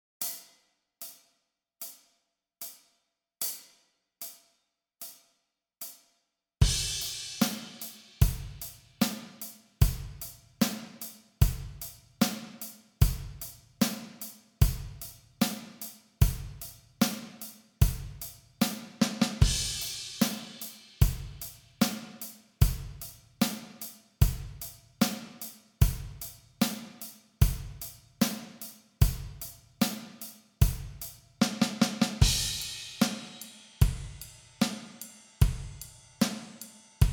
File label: 075 bpm, practice tracks